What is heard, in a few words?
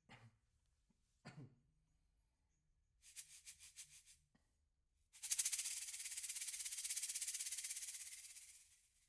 Shaker, 94bpm